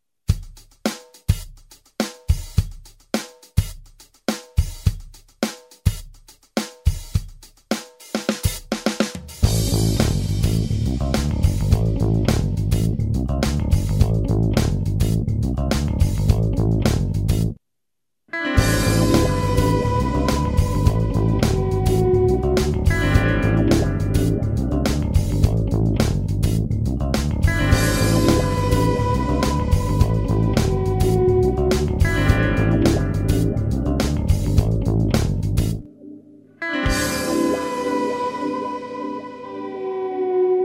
bass

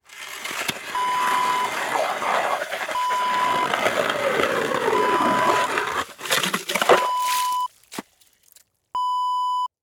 ice auger

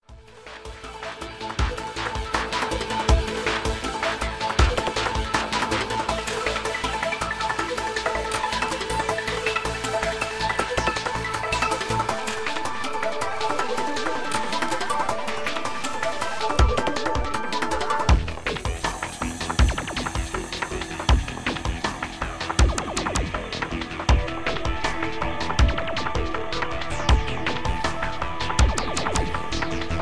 Ambiental Loop

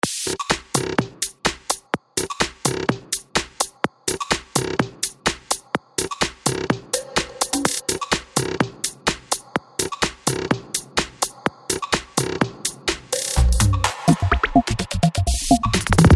Tech Drums

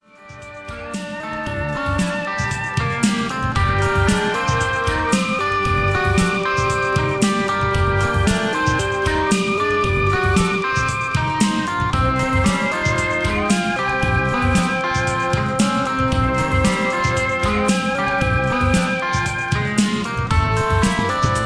Violin; Condor

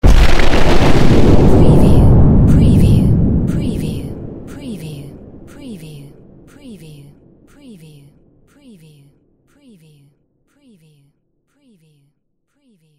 EXP SHORT NO DEBRIS CITY

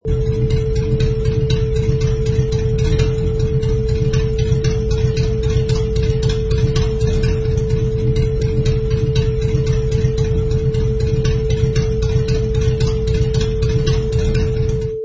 Mercury 255 CI Flathead Engine Idle

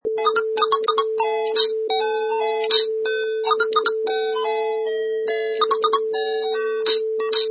Electronic Game

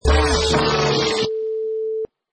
Vinyl Scratch Slide 100 bpm

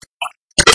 Pouring a Glass of Water Or Juice

